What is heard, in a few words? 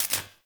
Shield Orb Zap; Powers